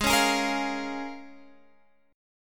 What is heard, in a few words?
Db 6th